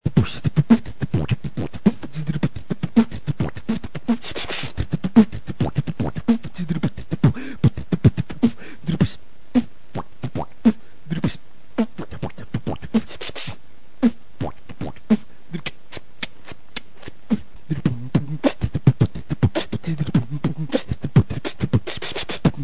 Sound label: Drum